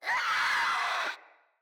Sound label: Infested Crawler Attack Short, Enemies; Crawlers